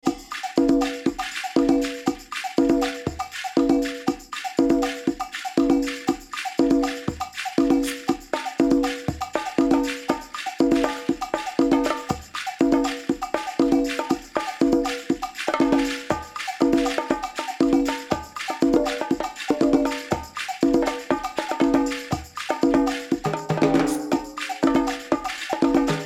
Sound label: Salsa Brillante, Latin Percussion